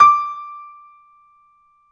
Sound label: PIA MICRO, MICRO-PIANO, Roland; Sound & Vision - Gigapack II